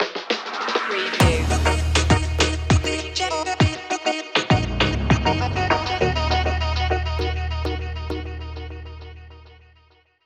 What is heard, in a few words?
cool jamaica style stinger